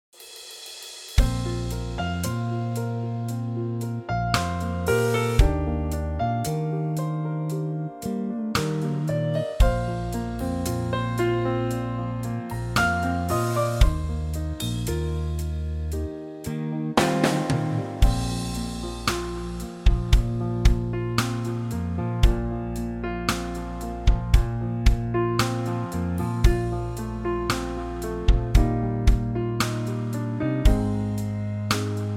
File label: trio Bb